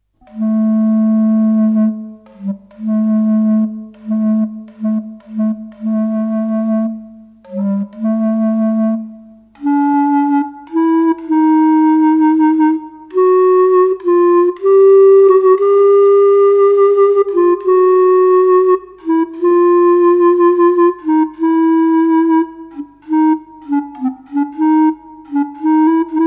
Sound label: Clarinet Audio